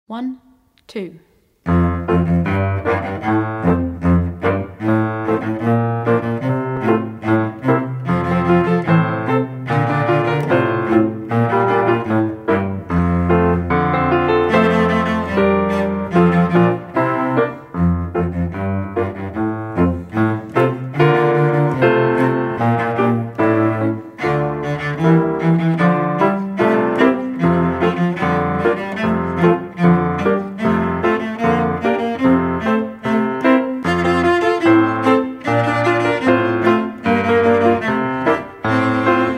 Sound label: cello; Sprint finish